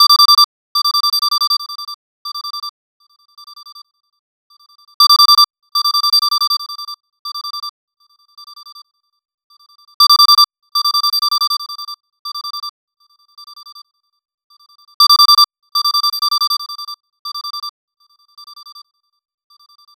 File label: Early Bird alarm; Sony Ericsson; PlusFourSix; Josephine (G700)